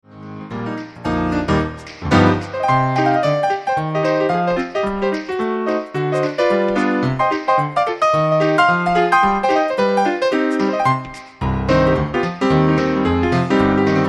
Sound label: little ragtime